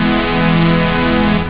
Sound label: jump string; Amiga Soundtracker sample